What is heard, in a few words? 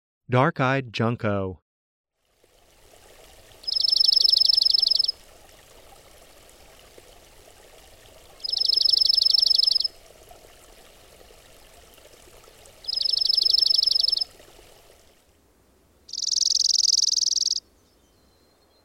dark eyed junco